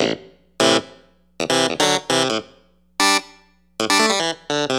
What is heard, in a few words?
Electric Piano, Loops, Keys